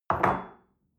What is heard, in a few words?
espresso place on table soft, restaurant cafe